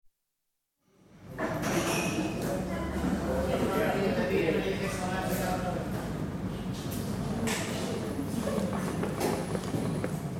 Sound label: Spain: Cafe atmosphere, with general chatter from older people, door opened & closed frequently, espresso machine heard occasionally; Restaurant Sound Effects; BBC